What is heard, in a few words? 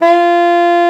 SLID F4, ALTOSAX; Xsample VOL-2